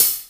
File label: sparkle open hi hat one shot g sharp key